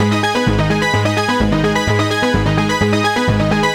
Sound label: NRG Arp, trance